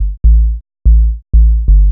HOUSE BASS 125 A

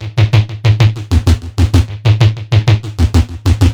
bass 128 Am; Electro; bass loops; Anthemic

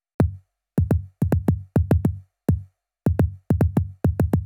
105 Loose Grooves, Percussion

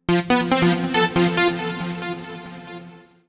TECHNO SYNTH 140; Keys

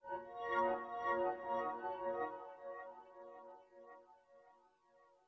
SYNTH PAD ETHNO 160 C, Sphere

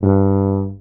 TUBA STRA G1, Tuba Straight; Orchestral; DirectWave; Varazdin Orchestral

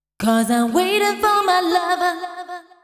deep house female, 125, A, vocals

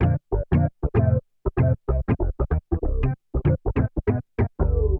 ORGAN 96 G; OLDSCHOOL; Keys

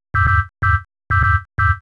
house synth 125 A, keys

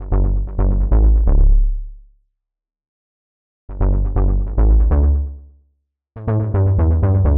130 C Layabout funk, Bass Loops; Touch Loops; BlissedOutBeats

